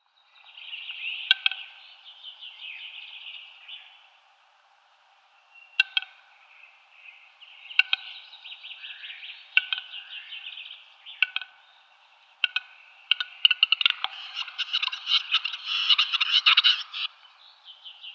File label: Capercaillie male display